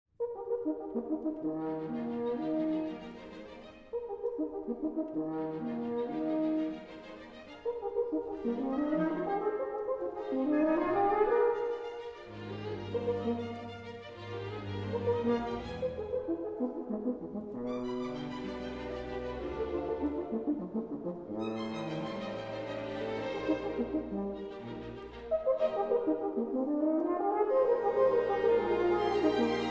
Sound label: Horn Concerto, Rondo; Allegro Molto; Strauss Concertos